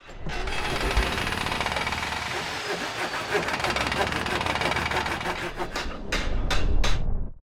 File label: repair distant